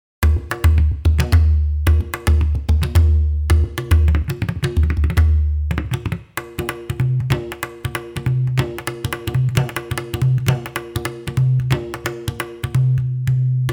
India Pakhawaj

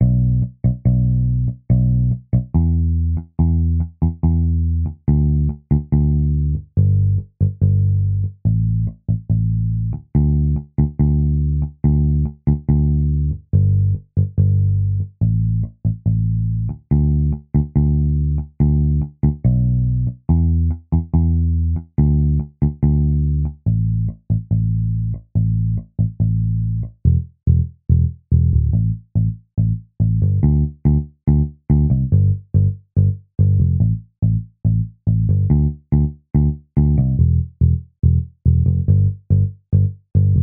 Liverpool Guitar

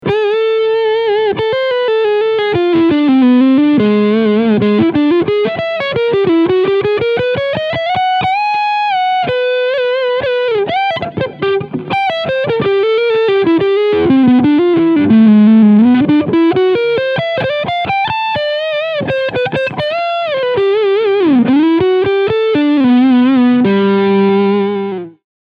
Squier Tele Lead Sound Neck PU; 40th Anniv

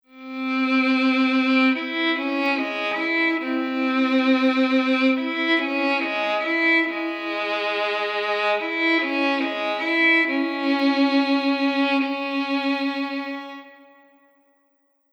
Violin Hall

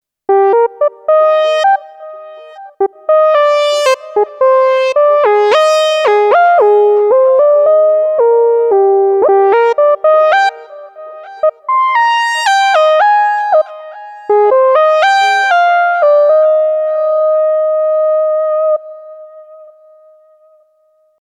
Yamaha DGX670 Lead Synth

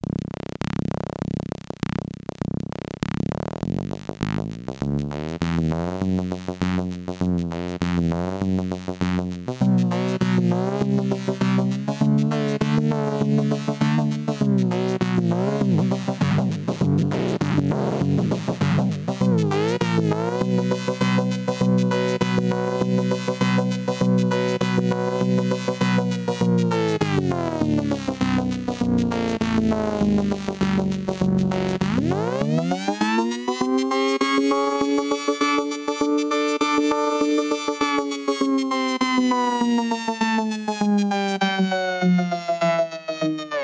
vocoder pitch tracker synth

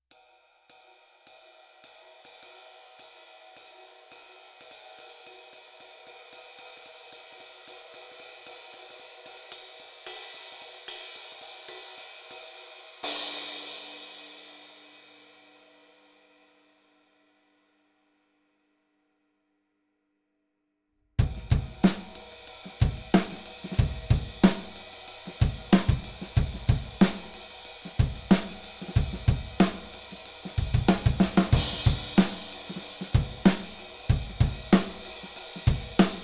Tradition Ride

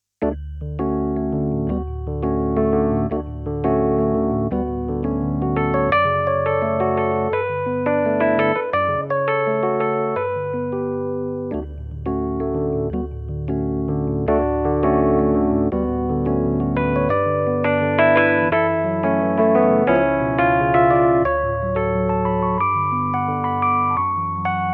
Rhodes

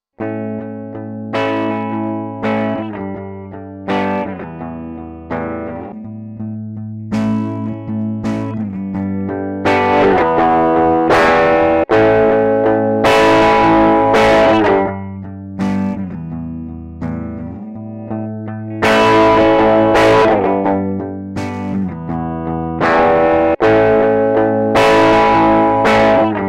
Boss EQ 200 Filter

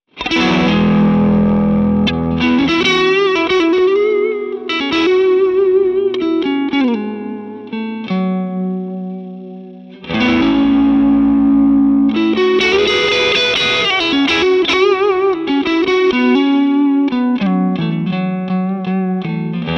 Edge Of Breakup Glassy Clean

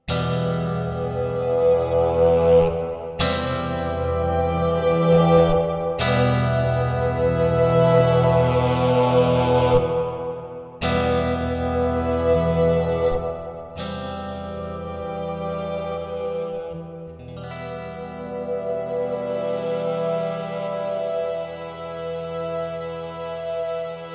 Brass plus clean Strat

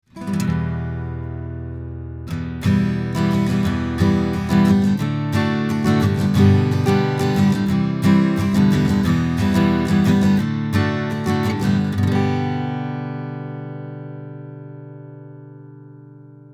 UR RT2 with NEVE Strumming Git Gain 2 oclock

